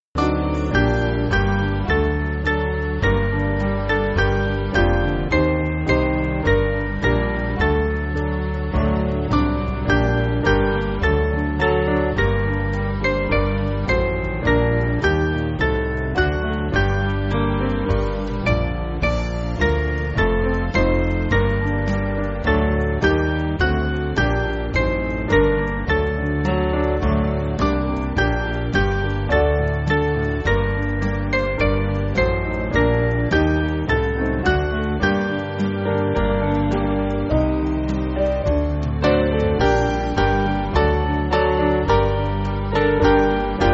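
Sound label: Piano, Free Music Backing Files for Churches; Rejoice Rejoice Believers; Llangloffan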